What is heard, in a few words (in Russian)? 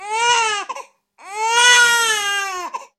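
роддом первый крик, плача ребенка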